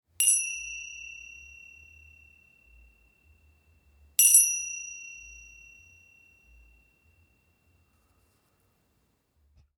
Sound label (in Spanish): Tingshas medianas de 6.5 cm Dorada